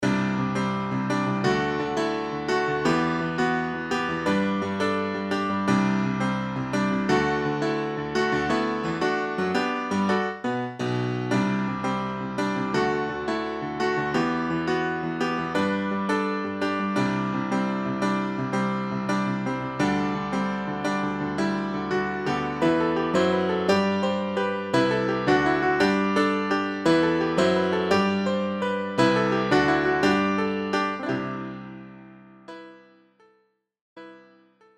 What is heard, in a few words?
piano